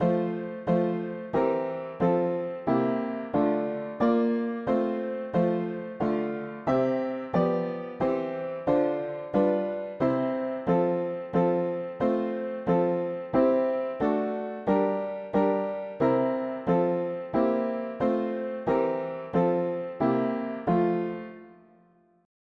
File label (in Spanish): mono piano; hymns; Himnario pequeno